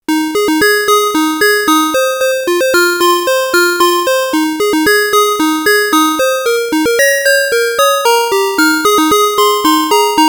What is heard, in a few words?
Chord B